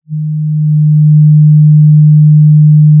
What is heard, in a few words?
pop, Sound of a cruise ship horn